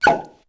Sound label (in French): bouchon